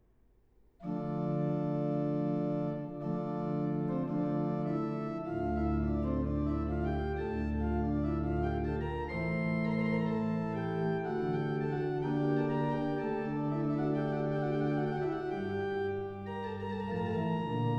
Intonazione del nono tono, for organ; Venetian Vespers

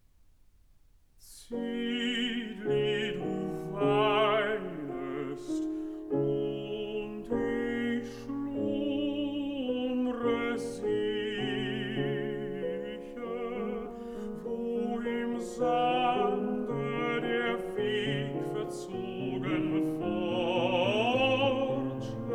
song for voice & piano

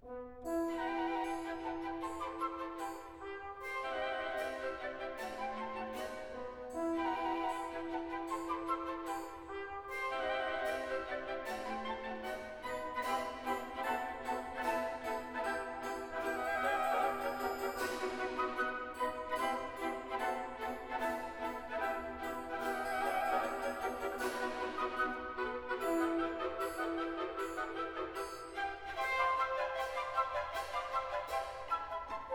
Fairies' march; Mendelssohn; A Midsummer Night s Dream

